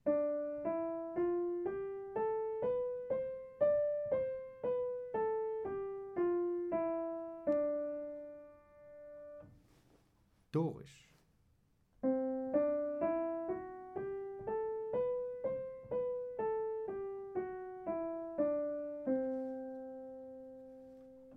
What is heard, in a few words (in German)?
Skalen; Gehoerbildung